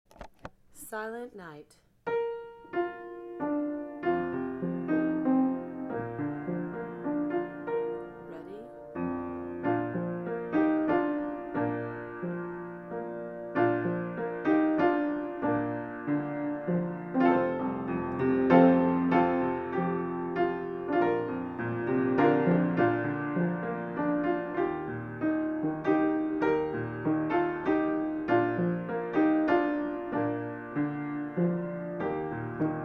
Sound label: silent night piano